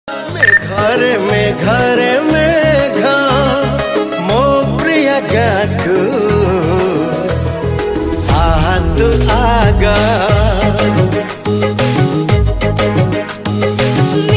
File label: Odia Ringtone